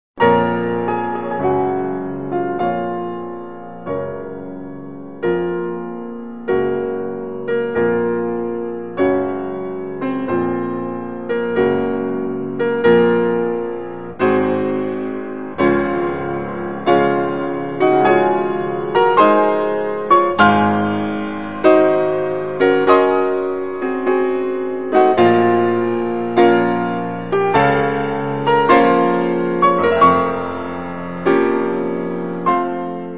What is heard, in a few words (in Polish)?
Marsz pogrzebowy